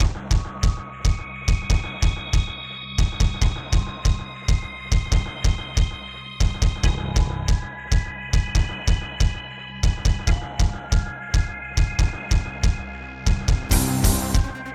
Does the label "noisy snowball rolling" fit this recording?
No